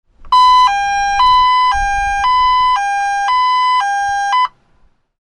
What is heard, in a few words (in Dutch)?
lied geluid sirene; kleuter